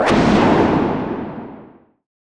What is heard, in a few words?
Burst Punch